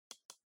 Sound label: PC Mouse Far C